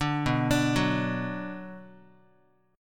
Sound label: B-Minor, Guitar; arpeggiated; JGuitar